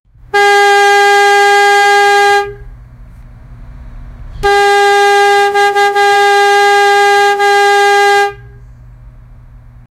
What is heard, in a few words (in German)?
presslufthorn, air horn